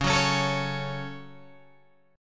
C sharp Suspended 4th Sharp 5th C sharp down Guitar Open G